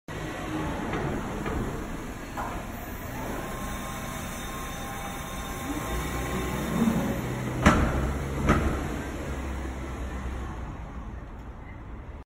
parking lot

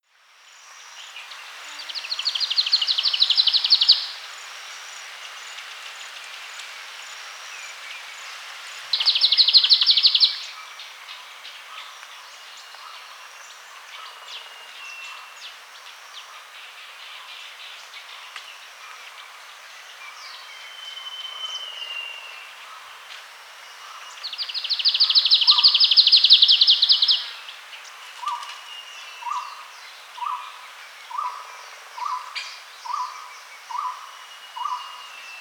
Hume's treecreeper

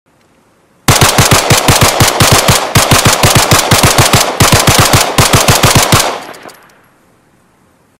Glock, تفنگ

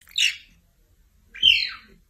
Caracal; سیاهگوش

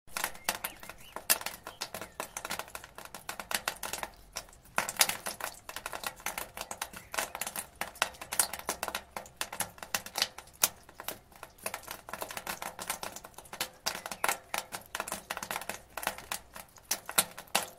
Rain on gutter

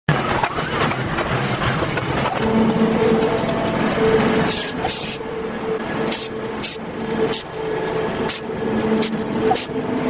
Rolling, نورد آهن

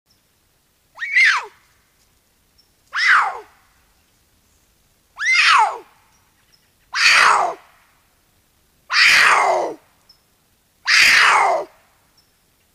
Cougar; شیر کوهی

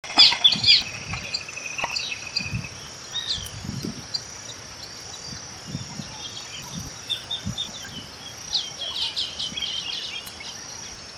Common waxbill

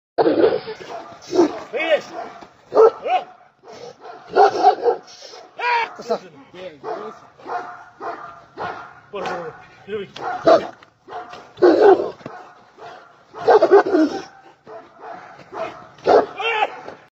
sarabi dog; پارس سگ; سگ سرآبی

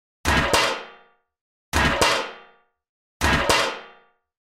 metal tray; افتادن; افتادن سینی فلزی

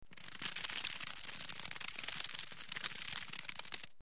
Centipede

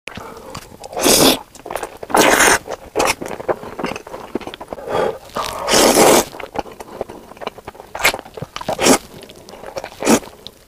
Edible noodles

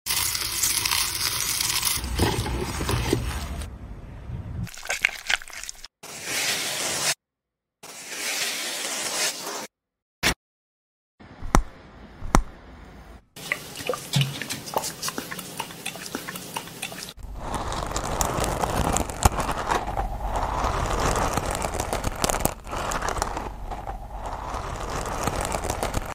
wax; کندن وکس

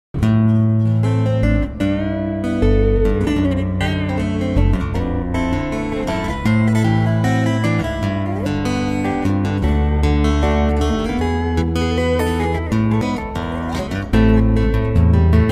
Chapman Stick

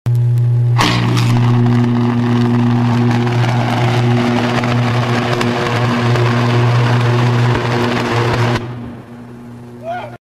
High voltage power

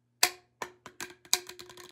plastic pipe

